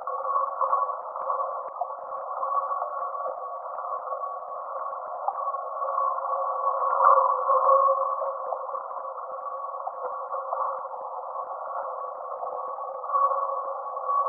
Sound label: glow atmospheric background